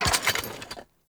Shrimp Lantern Drop Impact; Creatures; Baliq

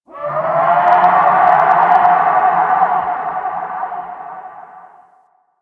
Distant Creature Shriek; Ambience; Warframe; Quests; The New War; Narmer Outpost

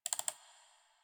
Computer Mouse Click Misc; Warframe; Ambience; Nineteen Ninety Nine

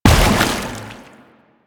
Step Water, Footsteps; Warframe; Lasrian; Lasrian Soldiers; Foley; Lasrian Chem Strike Nox

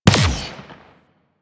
Multi Gun Fire; Enemies; Abilities; Arachnoid Wraith; Warframe; Corpus

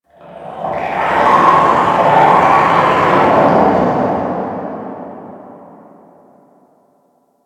Infested Distant Creature Snarl, Ambience; Warframe; Corpus Infested

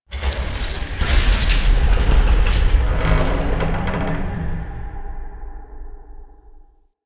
Asteroid Big Ship Groans, Ambience; Warframe; Grineer Asteroid

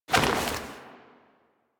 Air Roll Whoosh; characters; generic foley; Warframe

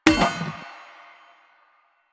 Street Sign Destroy; Warframe; Ambience; Nineteen Ninety Nine

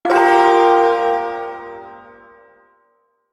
Ersatz Shawzin Phrygian Chord; Warframe; Duviri Ersatz Shawzin

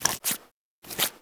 Foley Idle Alert Lick Leg; Enemies; Kubrow; Warframe

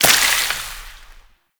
Void Hit

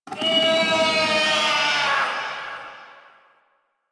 Feral Vocal Spawn; Warframe; Catbrow

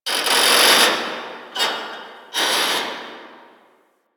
Metal Creak; Warframe; Quests; TheNewWar; Ambience; Drifter Camp

